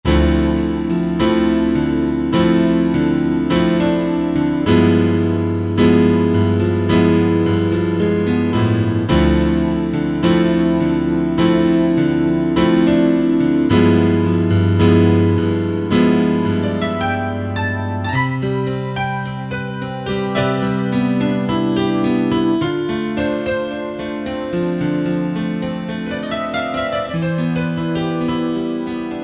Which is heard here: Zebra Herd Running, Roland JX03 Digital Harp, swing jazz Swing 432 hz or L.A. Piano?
L.A. Piano